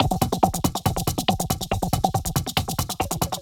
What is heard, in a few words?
Loops 140 BPM Hihat Percussion Loops